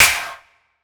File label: Snare, Dubstep Snares; GhostHack